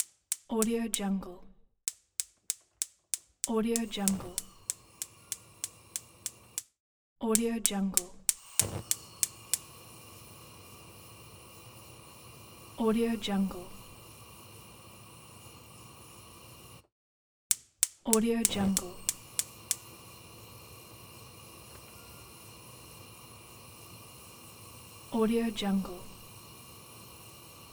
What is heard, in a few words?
turn on gas stove